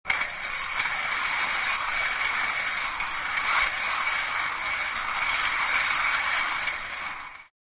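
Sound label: Casters Metallic Grind, wheels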